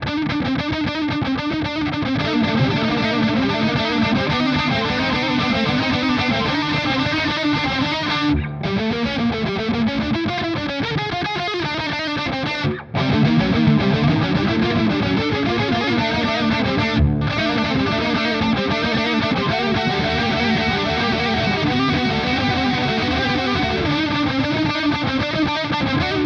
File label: Gain Bridge Pickup; Sterling By Music Man Richardson Signature